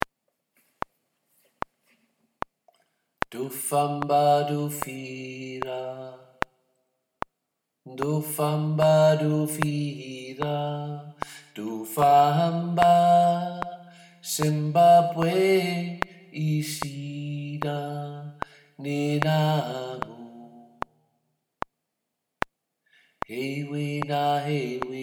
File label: Ndofamba Alt